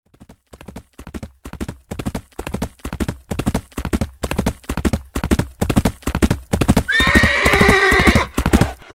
The Ghost Horse; Halloween

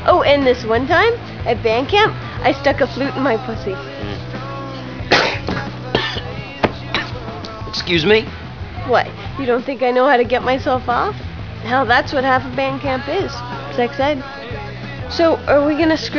flute

